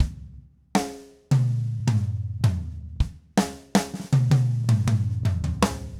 Green Maple Groove 80, Vital Drums, DW Green Maple Kit, 80 BPM; Sonic Foundry (Sony Creative Software)